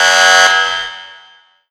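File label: alarm trainer civilian kill ambience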